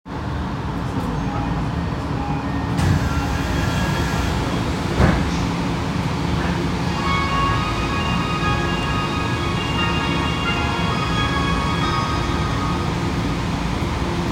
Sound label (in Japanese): 銀座線稲荷町東, train sounds